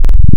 sine PWM; Synthetic's Serum Collection; Echo Sound Works Core Tables

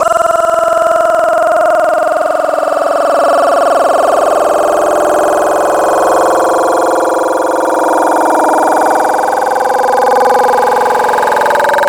Female Throwup, Vomit for Serum Wavetables; Synthetic's Serum Collection; Cymatics Vomit for Serum Vol 2 Wavetables

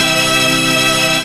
synth; 'Effection' samples; lolicore

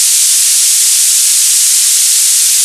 Blue Noise; Synthetic's Serum Collection; Chill Trap Noises

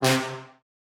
Trombone C2; Aubit; Louv Vol. 1 Noises

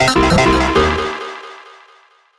stab sequence; 'Go Fuck Yourself' samples; lolicore

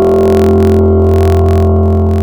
Bell Synth; Synthetic's Serum Collection; Chill Trap Tables

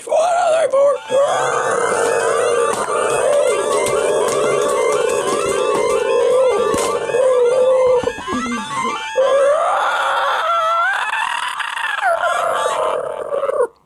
SYNTH CARDBOARD CHAIR

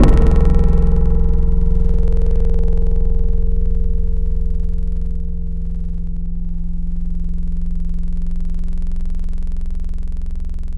Chromatic Hit, Tropical Mallets; Synthetic's Serum Collection; SparkPackers

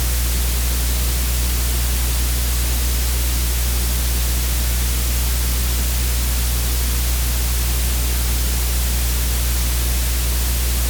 tape hiss; ESW Ear Candy Noises

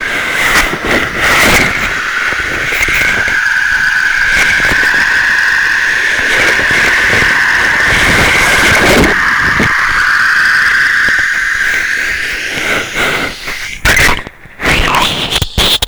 Pulling In The Hydrophone, Sacramento River

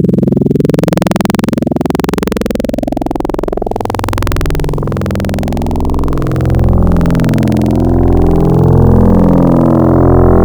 Fm Messy, Xfer Serum Wavetables; Synthetic's Serum Collection; Cymatics - Nuclear for Xfer Serum Wavetables

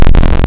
Square Collection; Synthetic's Serum Collection; Echo Sound Works Core Tables

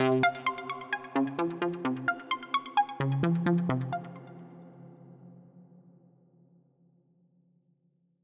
Serum ARP, Searching; Synthetic's Serum Collection

